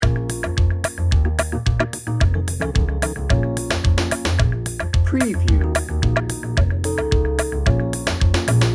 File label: samba shoes loop